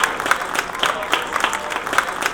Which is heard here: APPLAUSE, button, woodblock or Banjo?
APPLAUSE